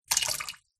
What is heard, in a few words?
nature water pour slight trickle short